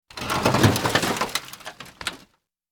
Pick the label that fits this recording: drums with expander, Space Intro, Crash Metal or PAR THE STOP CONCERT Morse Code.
Crash Metal